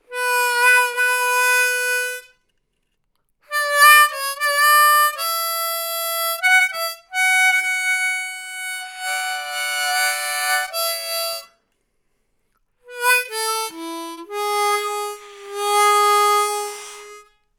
Harmonica U87

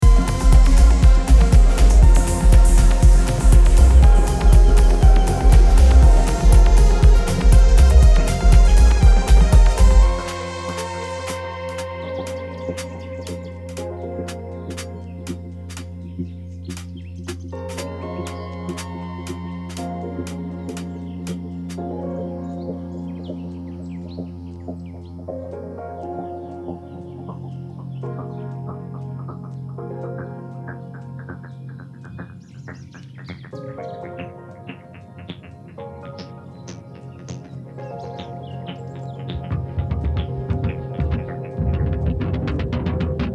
Techno master OUT

